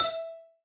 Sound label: Combo Sound; Candy Crush Soda